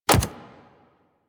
Step Default, Foley Footsteps, Pets

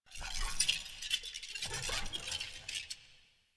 Moves Arm; The New War; Lua War Room Part Two; Erra

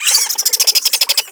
Infested Minion Pain; Warframe

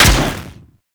Vomit Gun Shot; Warframe; Weapons; Infested

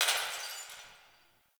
Distant Glass Breaking; Warframe; Ambience; Nineteen Ninety Nine

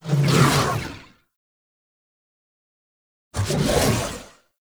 Pass By; Quests; The New War; Grineer Eidolon; Sentients

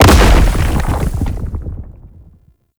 Octopede Horrors Rock Fall; Warframe; Man In The Wall

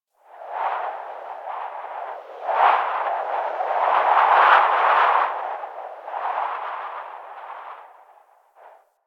Wind Gust, Ambience Plains; Warframe; Eidolon Plains; One Offs

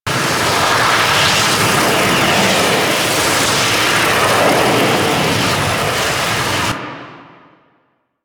Vortex Loop; Warframe; Trapper

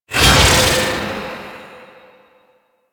Psychic Bolts; Warframe; Nyx

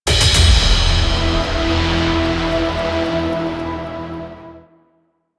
Stinger; Corpus Lich Cin End; Warframe

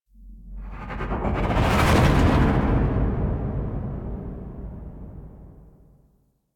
Ship By; Warframe; The New War; Zariman; Apartment

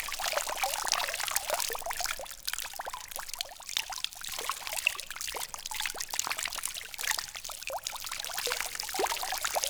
Cave Water Drip Loop

